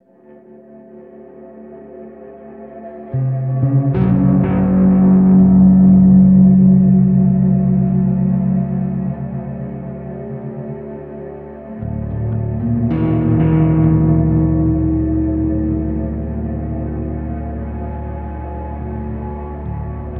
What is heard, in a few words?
Ambient; Spec Ops The Line